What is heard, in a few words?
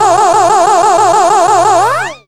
GUN GAMES; USB Soundscan vol.27